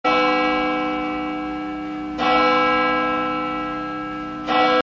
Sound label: CHURCH BELL SOUND